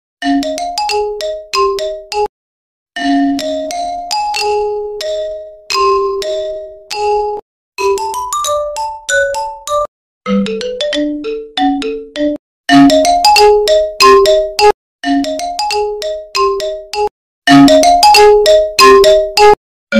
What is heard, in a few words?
Imo Ringtone